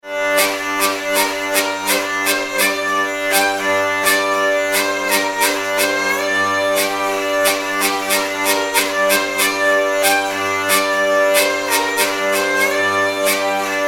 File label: branles